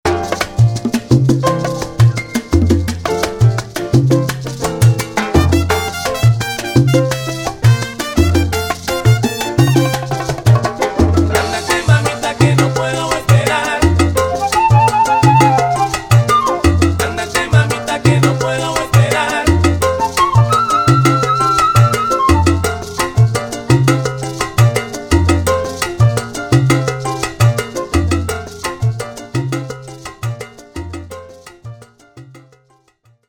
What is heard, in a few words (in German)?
Klavier Salsa